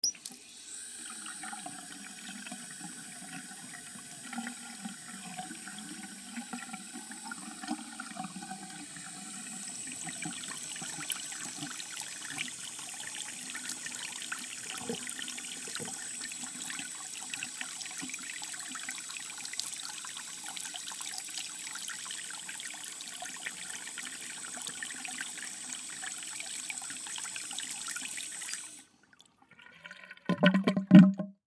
Household Bathroom Sink Turn On Run Turn Off Drain; Squeak; Gurgle; Blastwave